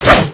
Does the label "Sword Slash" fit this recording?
Yes